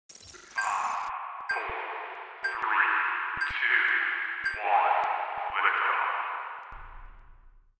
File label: count down; casino